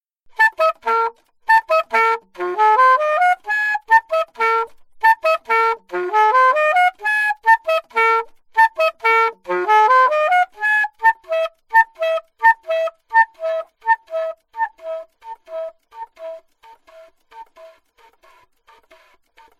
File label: altosax